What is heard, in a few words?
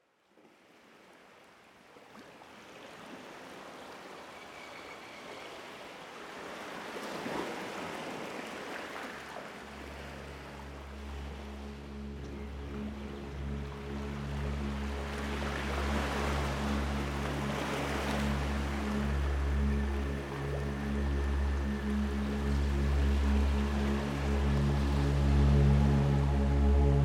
Oceanic Resonance